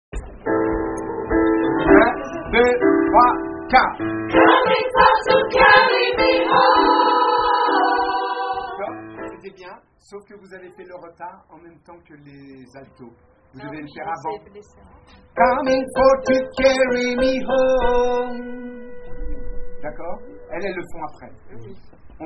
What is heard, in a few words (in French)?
femmes; Swing Low